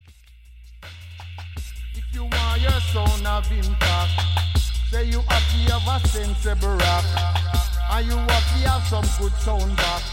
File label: Voltage (Drum & Bass)